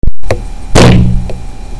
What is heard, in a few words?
wutufuuuuu